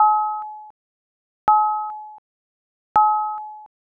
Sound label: radar